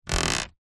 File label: household mattress movement creak